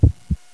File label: heartbeat; drums; xgsfx